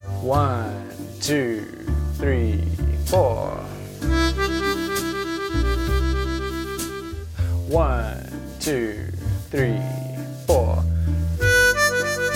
12 Bar Blues Warble Practice Lick With Backing Track, harmonica